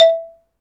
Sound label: XYLOPHONE, Percussion; ILIO